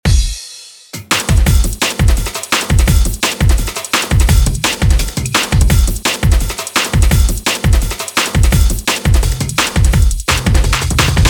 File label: DnB; drum; beat; Drum Blaster: The Ultimate Beat Generator, Sampler Plugin for Modern Producers